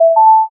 notify

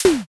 wood snap

